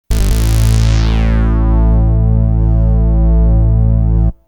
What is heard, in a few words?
saw